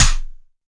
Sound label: Yamaha TX-81Z SD; drum machines